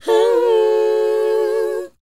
WHOA; ILIO; Vocal Planet

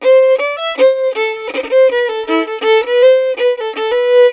VIOLIN, Celtic; USB Soundscan vol.42; Celtic Flavours; AKAI

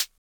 closed HH, Univox SR-95

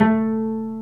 CELLO PIZZ; SOLO PIZZ ST; Greytsounds VOL-1

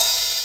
OPEN HH, TECHNO RAVE; Pro-Rec - Synthsonic

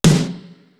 snare; East West; Global Sounds

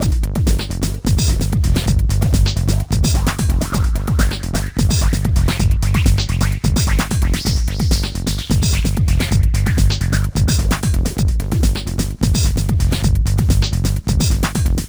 Blueprint, 129 Cmin, Hardcore Breakbeat; Big Fish Audio; Hardcore Breakbeat Action

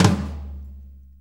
4-4 Tom Flam; 4-4 Drum Loops; Flams; Drum Tools

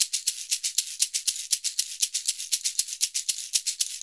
SHK, Shaker; Univers Sons; Basicussions

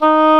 ob D#3, Oboe, AKAI S-1000

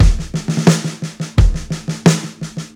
MARCHY, breaks, drums; Basement drums vol 2

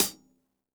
hihat - closed; JazzFunkKit

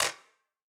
Wider Clap

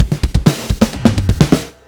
Fills 128BPM, Clubsounds

